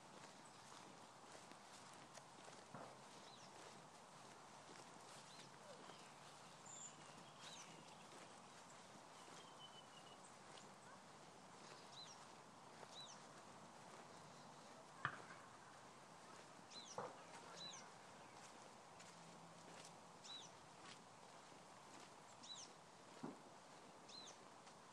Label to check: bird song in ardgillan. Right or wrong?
Right